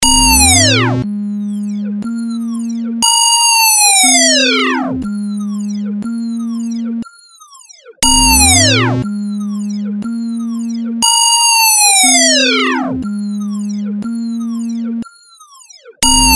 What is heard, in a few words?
Sinewave Project